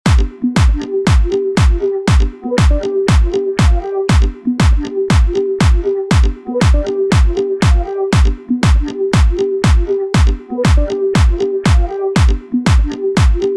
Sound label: Merengue Redrum